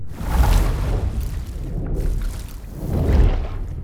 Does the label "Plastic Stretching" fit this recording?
No